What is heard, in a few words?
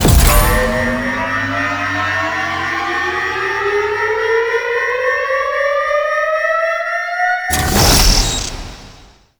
Evasive Warp Start; Warframe; Quests; Wraith Quest